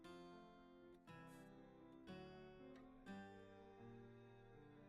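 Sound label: guitar B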